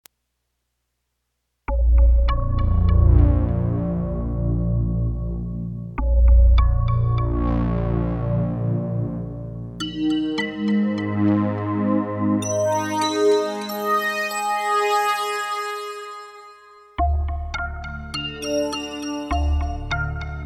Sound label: SY99